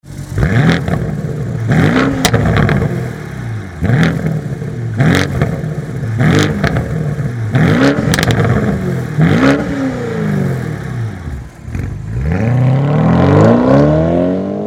BMW M550i G30 4,4l 340kW CAT-Back system Sport Mode; REMUS